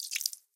Blood Splut; friday the 13th killer puzzle

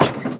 Crash; Buggy; PS1

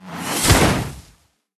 High Sorcerer Sheep spell; Linirea

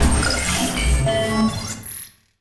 interface new moves; Hellpoint

